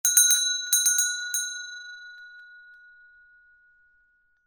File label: BELL JINGLE